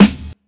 KIT, Dance Mega Drums, HIP; Best Service

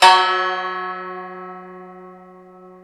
SU GZHENG, Earth Tones

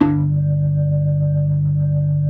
B-3 Blues; Keyboards of The 60's and 70's

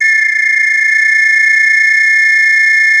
2 NOTE WHIS, World Whistles

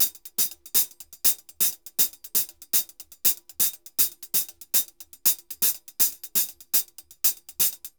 HH Samba 120 bpm; Best Service; Festa Latina VOL-1

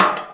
STICK; InVision Interactive; Percussion Slam; CAFFEINE

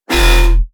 BASS SHOT

